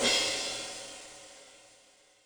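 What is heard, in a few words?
Crash Si, Cymbal, Yamaha DTXpress; drum machine; Crsh Si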